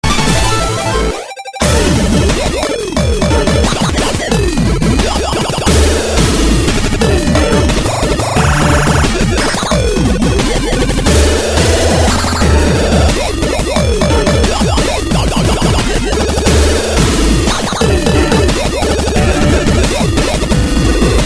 8 bit

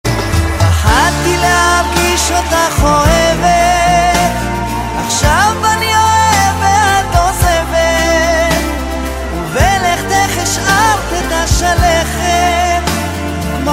Suspense

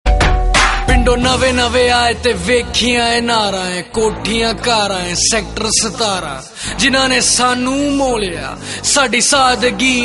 rap

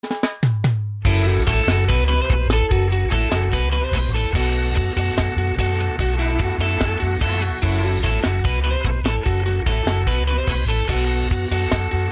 Surf Hit

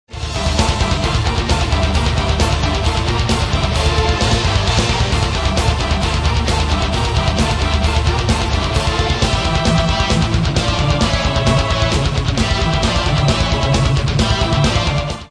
instrumental part

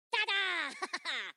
TaDa

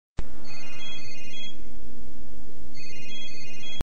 treo ringtone

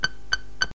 3 CLICS

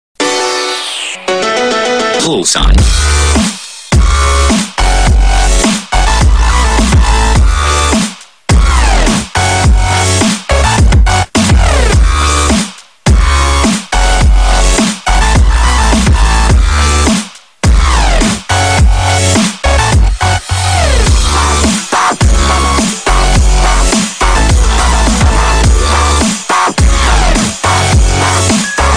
Trap

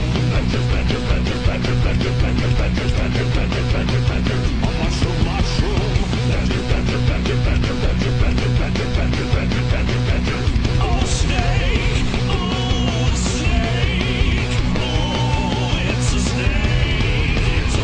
Metal

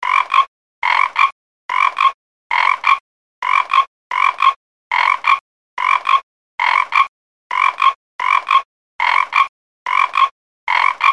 Froggy Sound

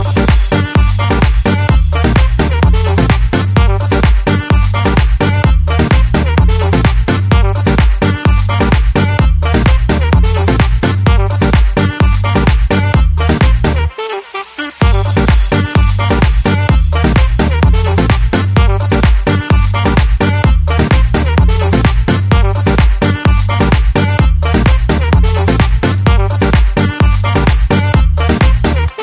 new, dance

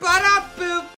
Bah duh boop

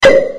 Bubbly sms

